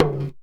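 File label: small tama hit; Best Service; World Colours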